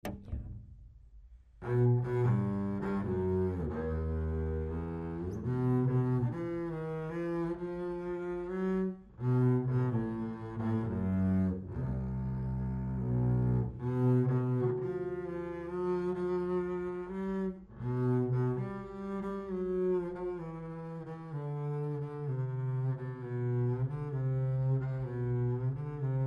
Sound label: Double Bass; allstate